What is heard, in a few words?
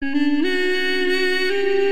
Tsungi Horn